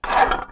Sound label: Metal Rustle